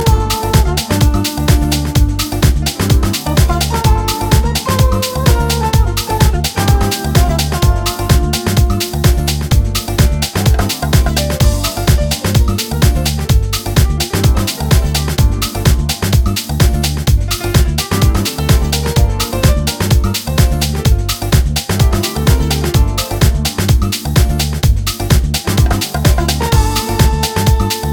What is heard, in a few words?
Jazz N Groove Remix